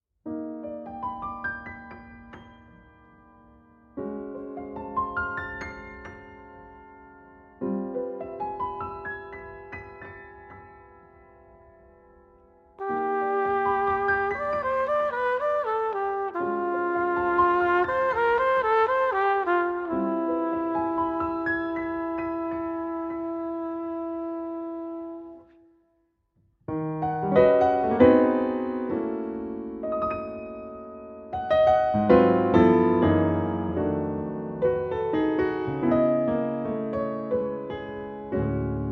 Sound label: Piano Concerto